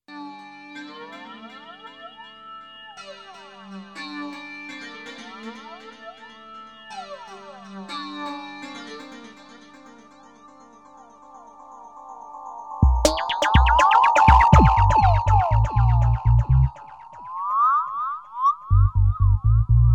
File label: Andean Dub